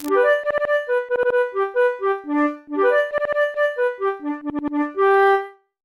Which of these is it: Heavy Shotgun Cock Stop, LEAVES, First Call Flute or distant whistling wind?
First Call Flute